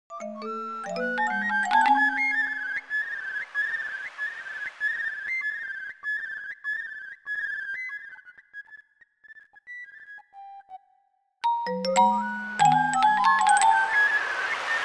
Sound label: curlew fractal tune; fractal music